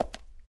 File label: hook; jb engine